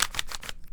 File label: Big Cube - Twist, Rubik's Cube